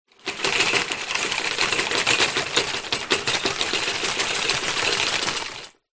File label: transportation bicycle wire basket shake movement